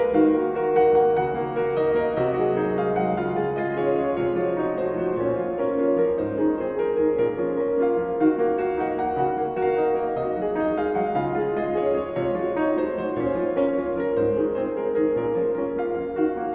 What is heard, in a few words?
Canto Ostinato, Minimal Piano